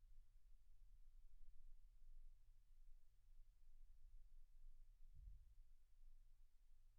silence 48kHz

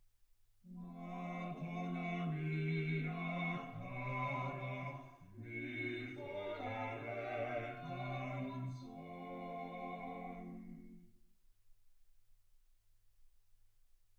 quartet

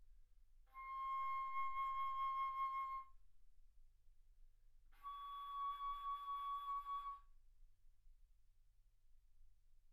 Bass Flute ff C6 Db6

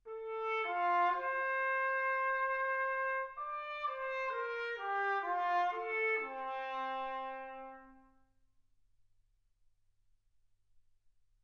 trumpet

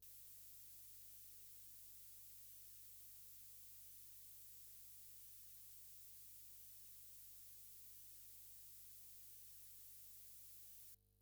mls 48kHz

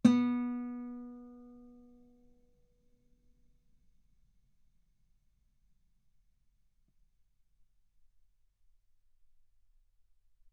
Guitar B3; ff; sul B

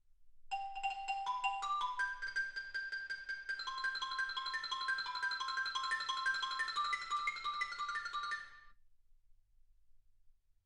xylophone

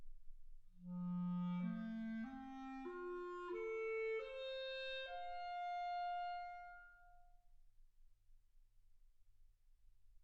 clarinet

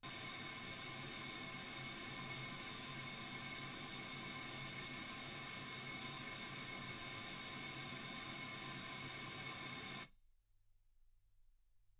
wgn 48kHz

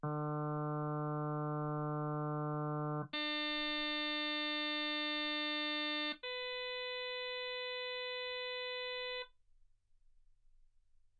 harm sinus 48kHz

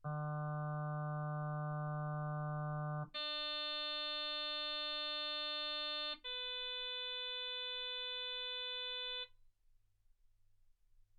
harm sinus 48kHz

